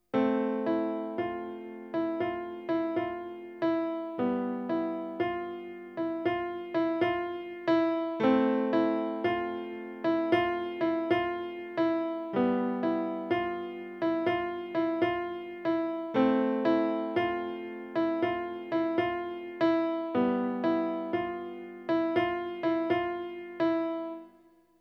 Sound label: piano, ambient